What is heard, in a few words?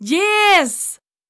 Accept